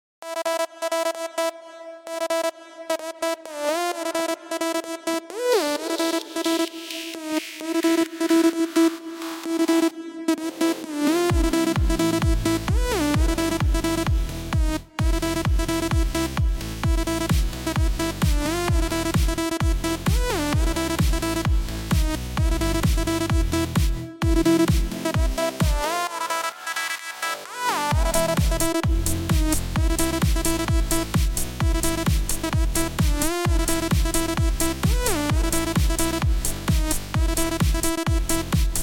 ELECTRO HOUSE

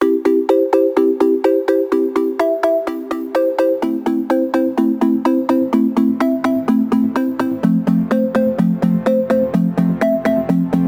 Instrumental

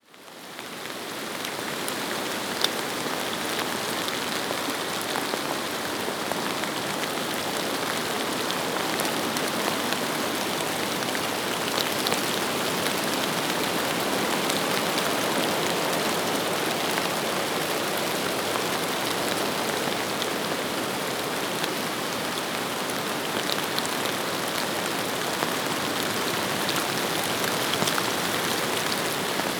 Rain Sounds Nature Sounds; Stressabbau

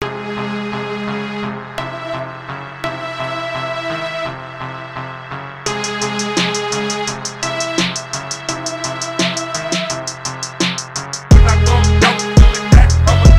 Phonk

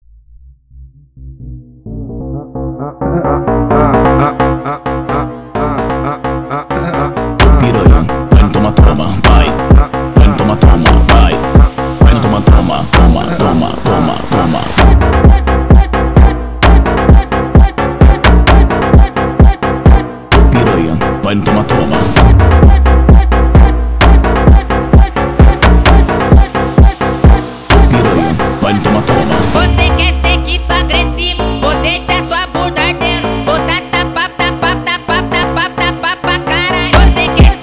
phonk MONTAGEM

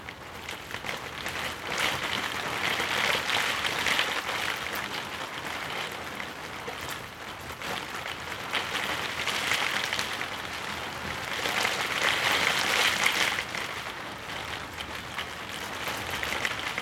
Tibetan Singing Bowls; for Relaxation; Sonidos De Lluvia y Tormentas

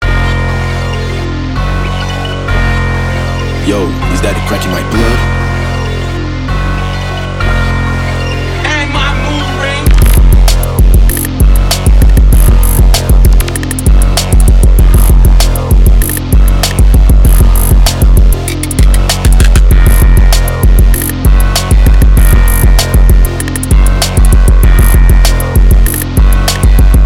Evil 195bpm

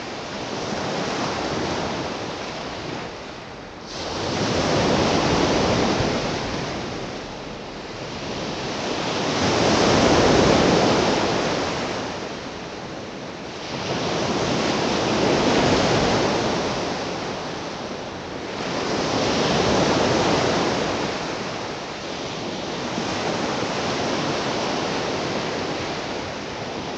Heavy Ocean Waves

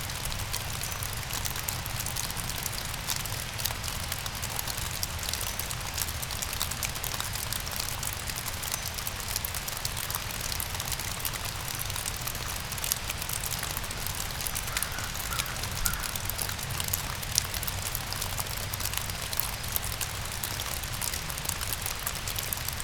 Sound of Rain Drops White Noise

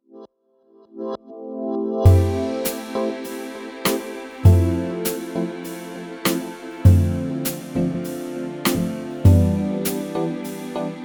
Vinyl Sounds, Lounge Bar

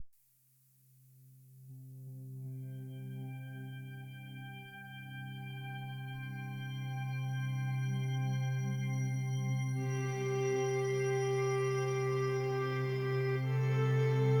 Rain Sounds Native American Flute

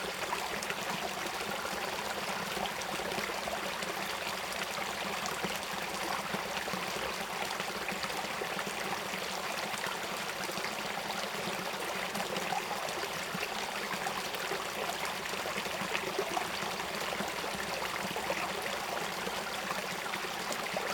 Water Stream Evening

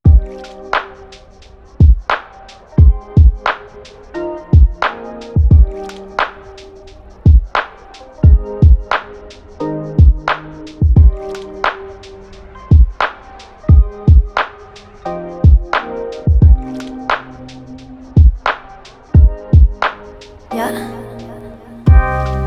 Shakuhachi

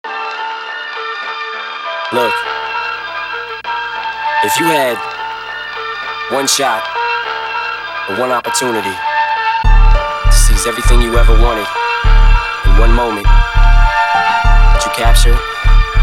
Acepella

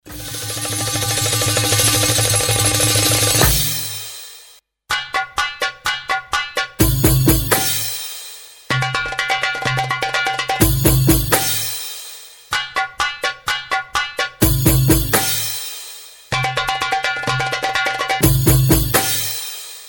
tabla solo

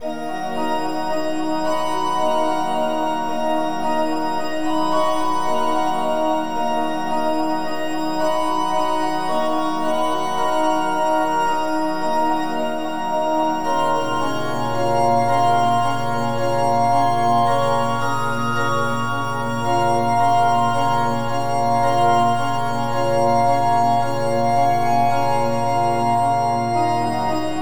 Chapel Organ

